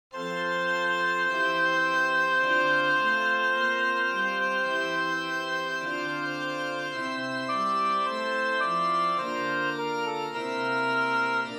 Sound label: church sonata II